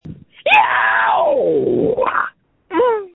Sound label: Scream